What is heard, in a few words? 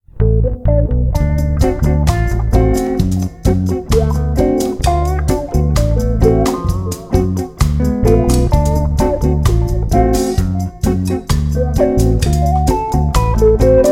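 half whole diminished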